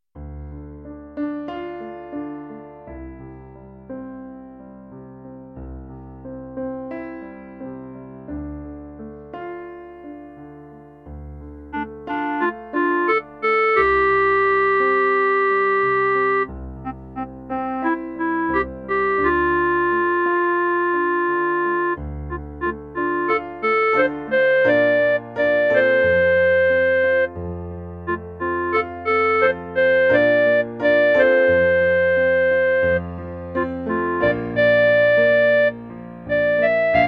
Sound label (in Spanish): CLARINETE